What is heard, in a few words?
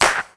natural hand clap sample g sharp key; percussion; single hits one shots